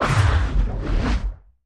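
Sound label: Small Underwater Explosion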